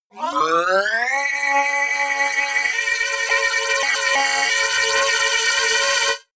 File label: phasing wobble swell; risers